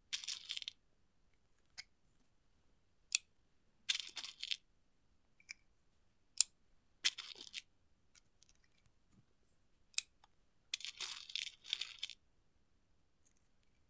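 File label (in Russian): Патроны вставляют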